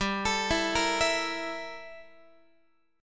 G Minor 6th Add 9th G down Guitar; Gm6add9; arpeggiated

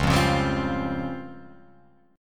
Db Major 7th Flat 5th Db down Guitar Open F; DbM7b5 strummed; JGuitar

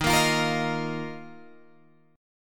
D sharp Suspended 2nd D sharp, Guitar Drop D; chord shape

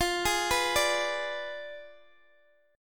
F Diminished 7th F down Guitar Open D

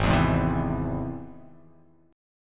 G sharp Augmented G sharp

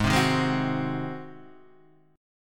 G sharp 7th; G#7; strummed; 4,3,1,1,1,2; JGuitar

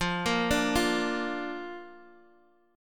D Minor Sharp 5th, F

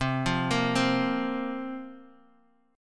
C 7th Suspended 4th

